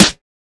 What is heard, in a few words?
wet steel snare drum sound c key